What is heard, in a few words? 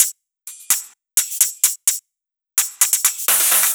Loops 128BPM, Drumloops